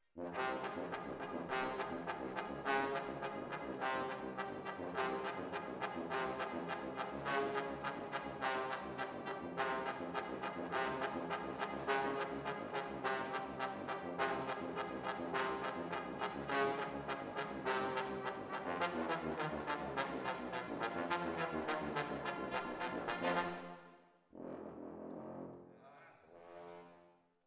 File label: ORTF, Jazz for piano